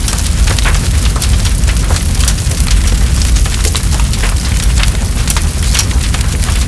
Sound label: burning metal